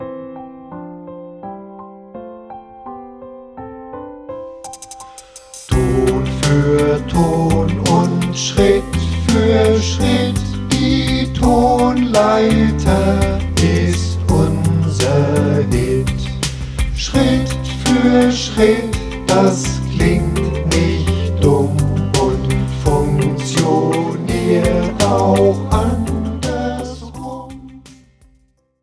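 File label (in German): Die Tonleiter C-Dur, Flötentöne; Meine ersten Floetentoene 2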